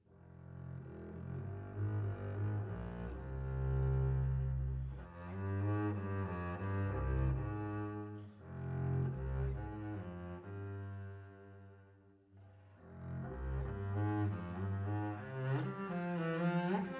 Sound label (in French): contrebasse